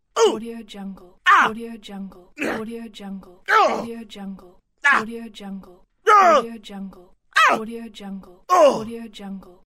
male grunt

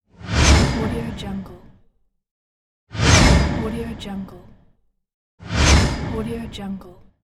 whoosh

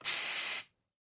pressure cooker steam release short; blazznet

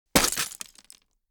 debris impact; prosoundfx

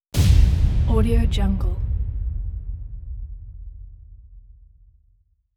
eerie dramatic metallic impact

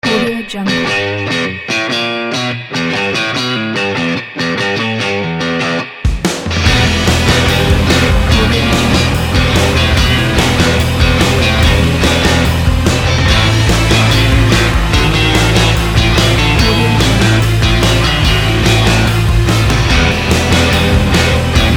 surfing spy

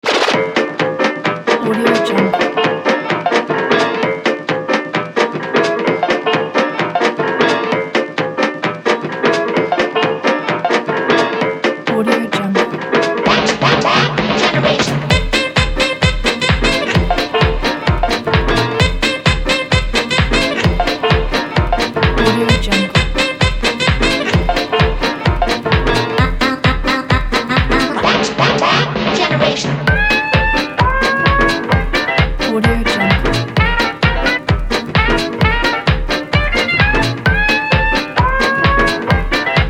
funny moments electro swing

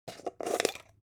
object jar plastic midsize open short

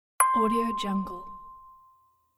incoming sms text message; audiomonkery

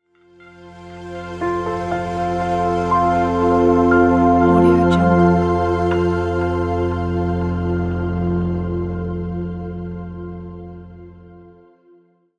great idea logo

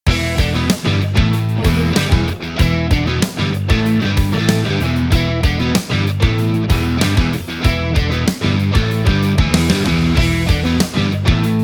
upbeat rock background

